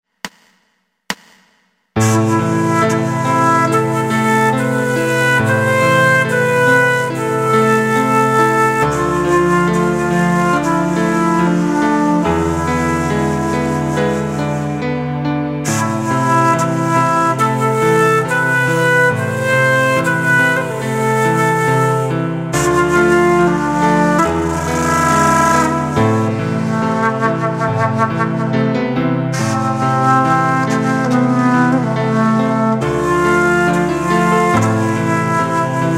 Shakuhachi; Koujou No Tsuki

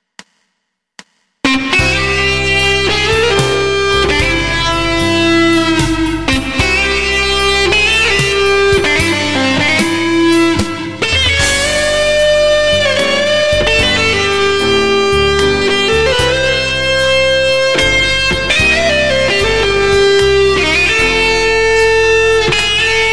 Silent Guitar; Guitar Hero

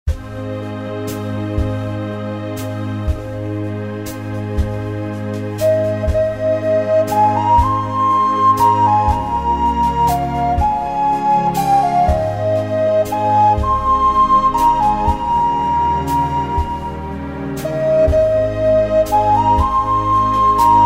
Ocarina; Hamabe

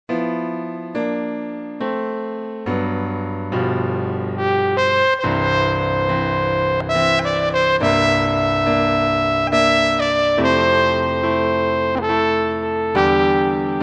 Brass 2 Bone

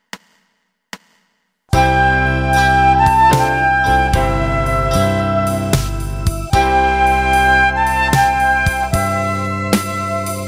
Flute; Silent Night; C Flute

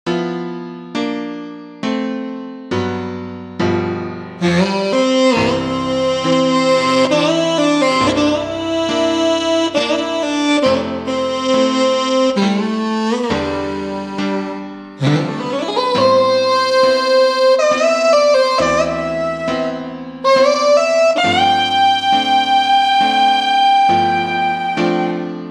Voxo Saxo

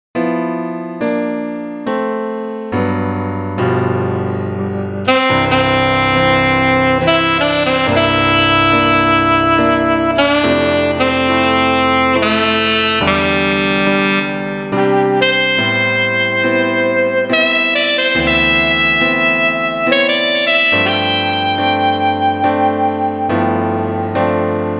Amazing Sax; M1 Le Sax

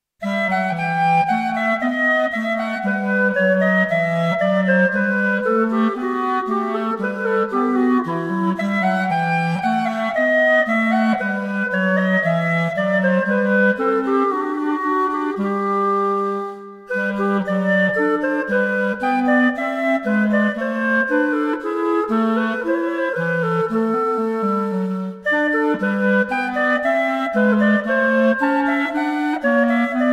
Bourree

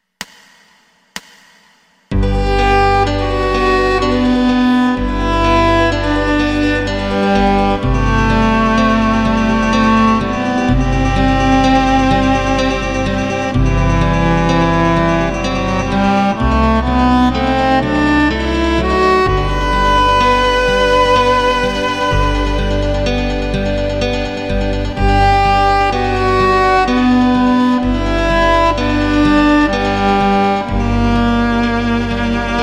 Hakuchou Cello

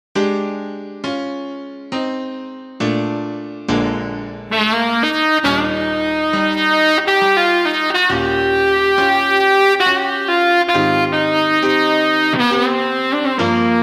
Glas Tnr

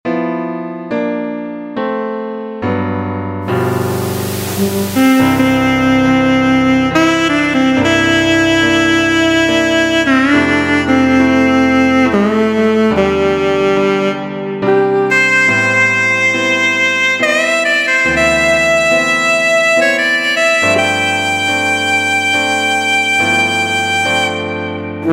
Amazing Sax; Sax Lab 2

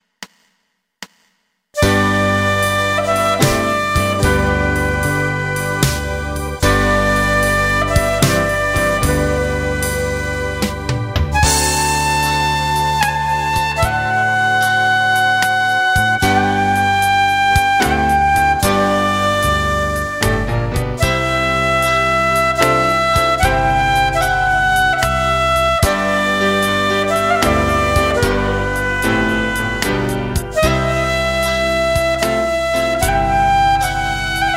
Silent Flute; Nz Flute

